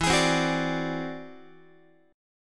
E Augmented 9th E down Guitar 4A 4E 5A 5D 5G sharp 6C sharp; strummed